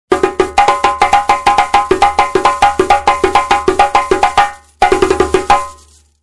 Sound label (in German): Djembe